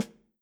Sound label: Snare Stick Hit Medium Dry Session, drums